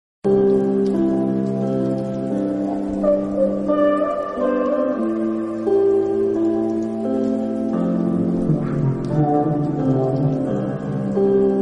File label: sad lovely background music tone